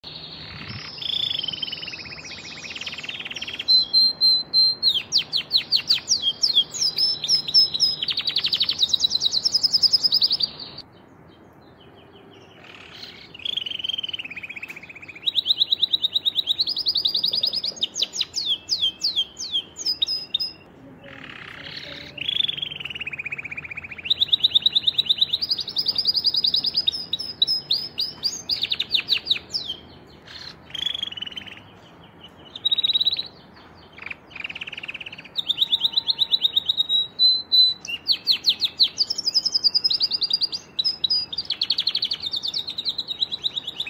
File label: Canary, Bird Songs